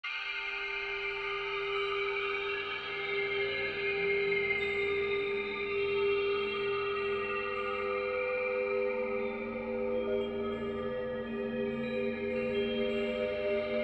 Dream 2 (Ambience)

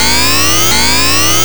ambient alarm

